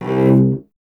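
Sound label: strings cello C; Miroslav Vitous; Solo Instruments VOL-1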